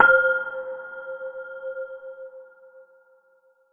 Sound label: Roland U-220 Metallic Percussion C5